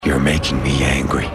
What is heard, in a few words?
hulk angry; "You're making me angry"; Dr. Bruce Banner; Eric Bana